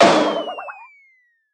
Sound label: ball hit; Handegg